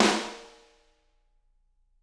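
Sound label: snare drum; Bob Clearmountain Drums I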